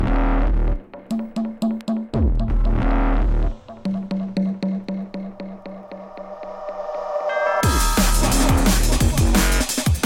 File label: drum; ruckus